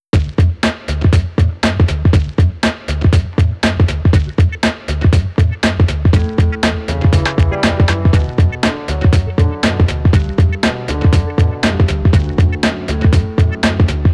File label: Melodramatic Band; Backbeat